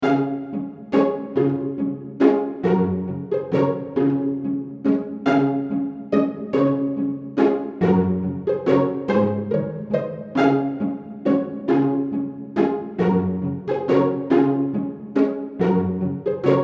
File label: Delicate Pizz; fantom-x8; patch demo